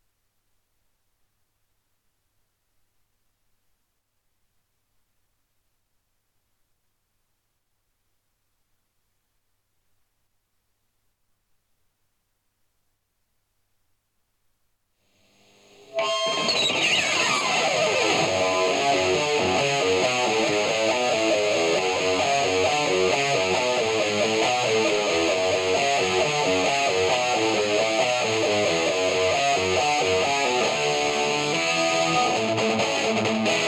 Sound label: guitar